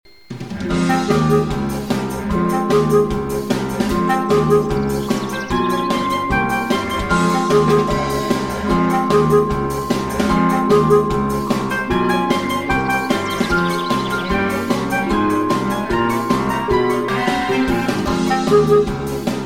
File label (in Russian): Музыкальная тема из мультфильма; Лунтик